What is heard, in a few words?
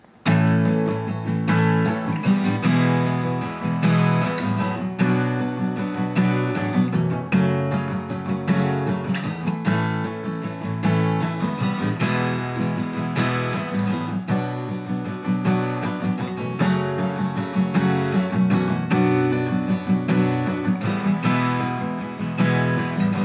songwriting backing track